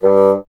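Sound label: wind bassoon G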